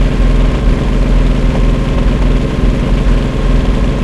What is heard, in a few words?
cromwell engine int rpm 99 load